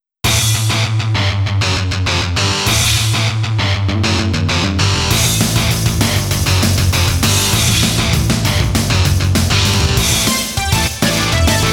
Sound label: Raise Fiercely ~Heavy Metal Training~; Yu-Gi-Oh! Monster Capsule Breed & Battle